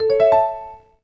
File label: start, NVDA Sounds; waves